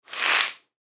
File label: Window Blinds, Window Blinds Lower Fast; Windows; Household; Blastwave